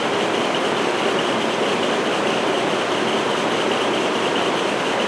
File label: kitchen; Star Wars Knights Of The Old Republic I; XBOX